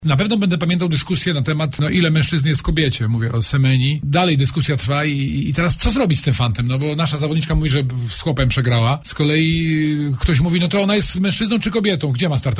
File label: flugel